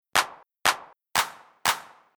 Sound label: House Clap, Rhythm